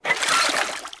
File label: Sfx Pump; Charlotte's Web: Wilbur and Friends